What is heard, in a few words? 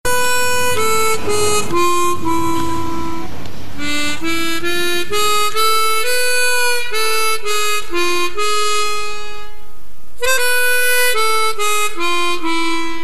Slow Song